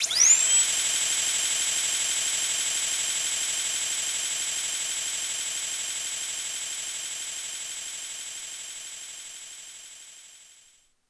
Propeller Suit Drill Down; New Super Mario Bros. Wii

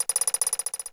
Spinning Platform; New Super Mario Bros. Wii

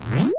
Spike Throw

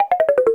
Giant Dry Bones; New Super Mario Bros Wii

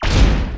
Door; New Super Mario Bros. Wii

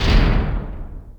Door Castle; New Super Mario Bros. Wii

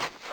Foot Step Snow

